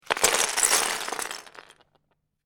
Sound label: Lego Several Pieces Drop On Floor; Miscellaneous Toy Sound Effects